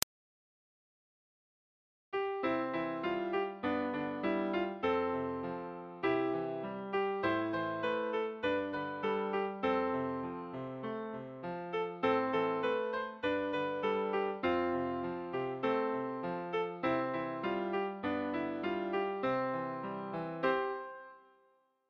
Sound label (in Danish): bladgalop